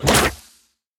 dragons enemy acid alfa attack

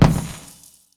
desintegrate; hero; nivus

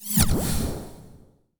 Polimorph

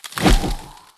shard spear throw; durax

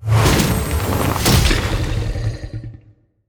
catch arm again; crocs; abominor

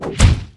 boss mono enter scene

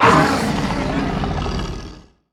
broodmother veins roar, dragons

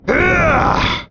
Elemental Death

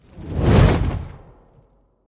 ring of fire explode

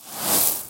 sand warrior; hero; alric

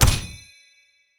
golden bow instakill con chime

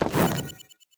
hero stones armed; sfx

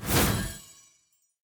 heroes call Of Triumph out; lumenir

